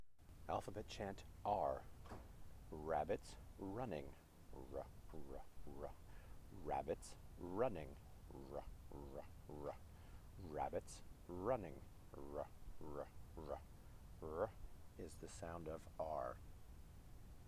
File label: alphabet chant 'r' slow